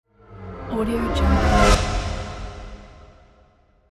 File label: futuristic whoosh